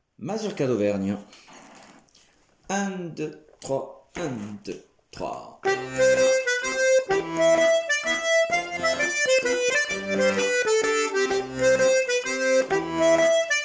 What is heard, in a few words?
mazurka d'Auvergne